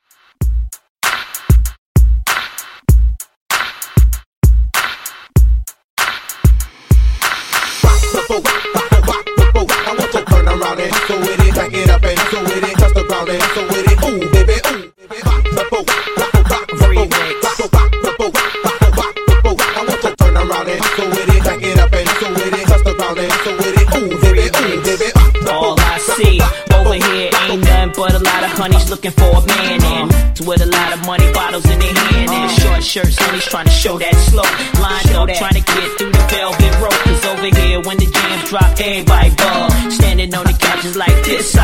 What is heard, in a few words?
Hype Oldshool HipHop; old school hiphop